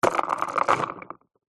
leisure toy car wheel spin freely air

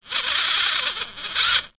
science fiction robot motor server movements

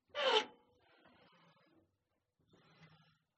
Woodstove Door Iron Open; uberduo

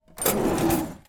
household drawer cutlery kitchen close

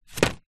foley paper airplane land hit surface

